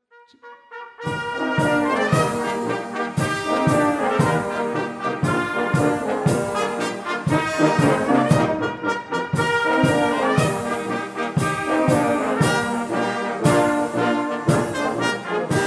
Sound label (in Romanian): Fanfara